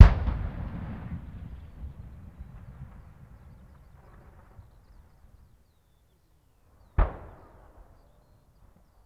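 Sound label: weapon anti-aircraft gun firing distant short; Explosions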